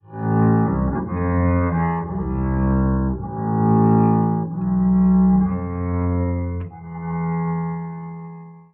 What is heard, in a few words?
bass, Sad